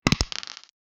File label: puzzle, plastic